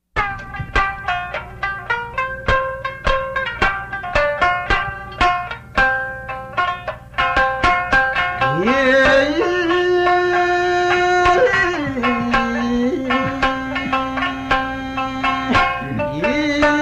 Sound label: Japan, Folkways; Ima nu Kazekumo